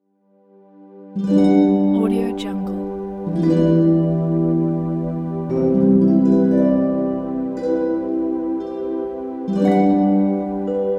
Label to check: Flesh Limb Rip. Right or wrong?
Wrong